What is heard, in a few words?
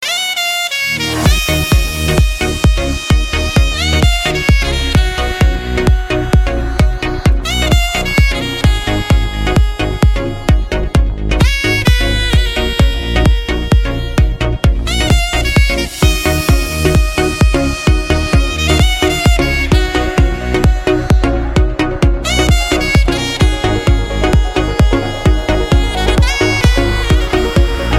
Saxo; Sensation 2013